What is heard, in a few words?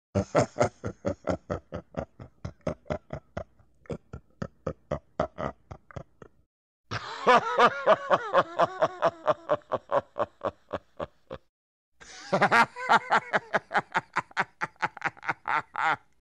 Demented Laugh